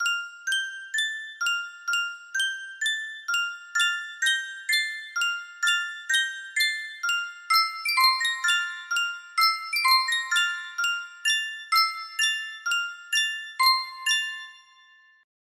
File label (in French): sankyo 12 note boite a musique